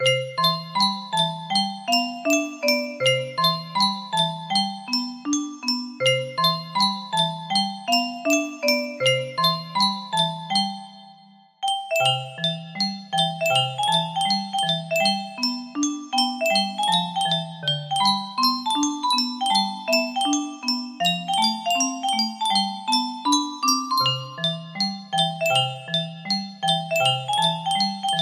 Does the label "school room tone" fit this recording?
No